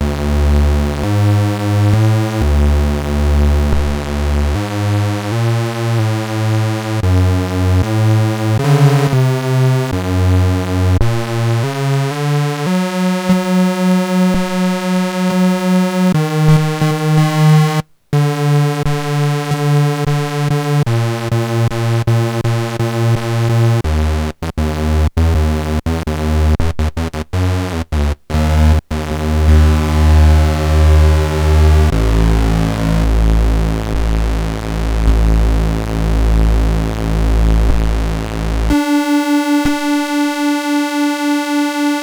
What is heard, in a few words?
Modular